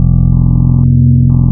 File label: BELL, wavetables, Classic Synths